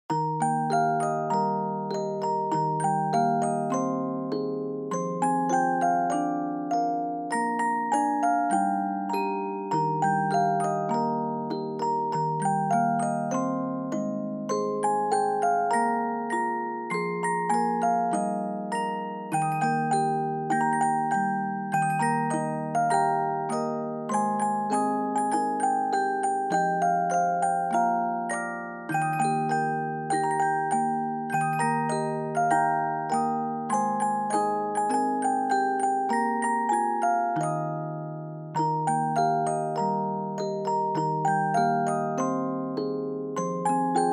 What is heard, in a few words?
Dry Fast; Jingle Bells